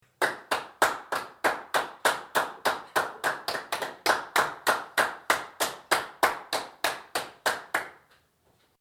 Applause Two Persons Clapping Hands, Almost in sync.; Applause Sound Effects; Lynne Music